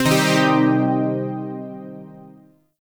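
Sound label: Shawzin Pentatonic Minor Chord; Zariman Shawzin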